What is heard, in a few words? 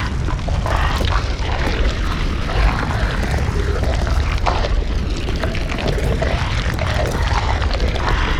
Tongue Grab Aim; Evolve; Behemoth